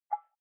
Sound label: elements water dabble single glas bowl hard; smartsoundfx